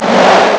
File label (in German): Sturm; ST-XX Amiga Soundtracker Sample Packs